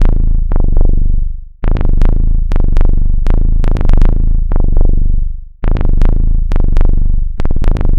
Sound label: Bass Line; Eclectic Electronica